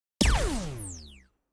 Blast Laser, Sci-Fi Blaster; Async Audio

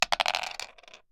Plastic Dice on wooden board 2 Dice; Board Games; CB Sound Design; Gamedesigners Audio Toolkit 01

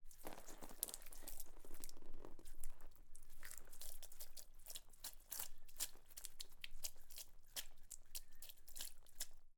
Blood spurt on carcass, deer; Coll Anderson

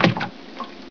slosh, player; hl2

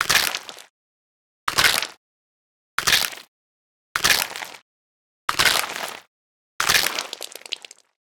Bite Creak Blood Juicy; MatiasMacSD; INFECTED ZONE

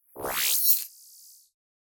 Droid UI, Battle Droid; Unreal SFX

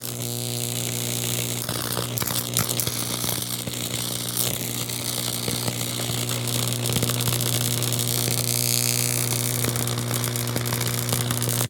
Damp Electric Shock; Hear and Now Sound; High Voltage Electricity; The Essential Collection

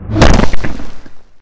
hydra bump; hl2

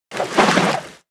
Action Swirl Whoosh; Hits; Mechanical Wave

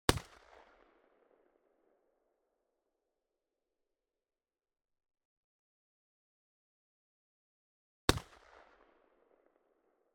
Beretta 1201F FIRING 10m Behind; Holophone; Pole Position

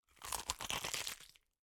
GORE Flesh Pry; Coll Anderson

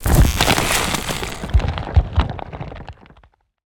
organic texture; BlueZone; Alien Planet Ambiences and Sound Effects

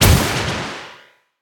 shooting a way through - sound, Laser Guns

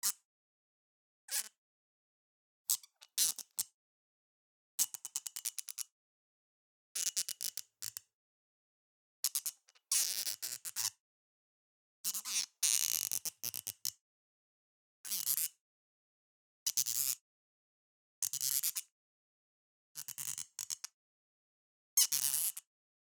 Bottle Cork Squeaks; NTG3; Russell Gorsky; Squeaks and Creaks Two

